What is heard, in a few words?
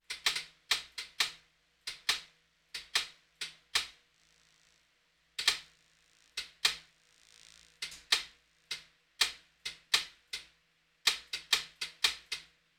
Hospital effects